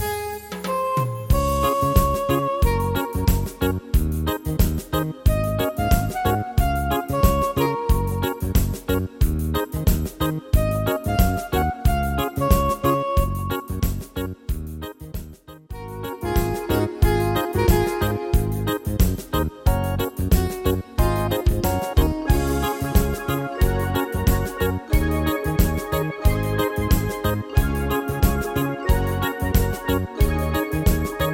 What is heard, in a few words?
Reggae Hits